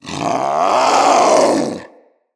Vocal Alerted, Hunting Critters; Characters; Vulpaphyla

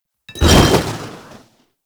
Molotov Throw; Grineer Wolf Gang; Gangster

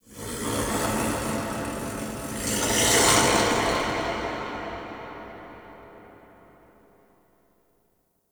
Creature Distant Low; Ambience; Misc Outdoor; Warframe; Infested Eris

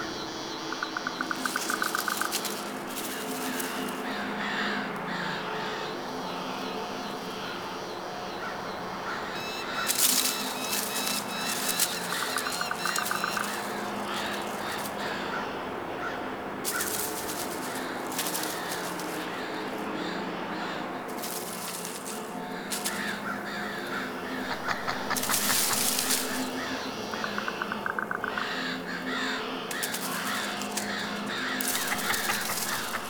Exterior Foreground Field Sad Scared Loop; Warframe; Duviri

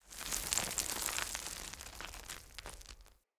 Cliff Rock Debris Small; Ambience; Midrath Island

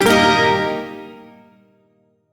Shawzin Blues Chord; Warframe; Duviri

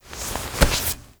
Generic Hand Plant Foley; Warframe; Characters

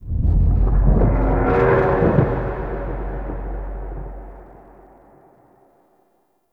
Dragon Passby Death Stinger; Ambience; Gameplay; Mainland A; Duviri

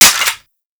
Sicarus Mech; Warframe; Weapons

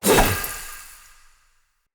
Dagger Throw Perfect; Weapons; Player; Swords; Fey

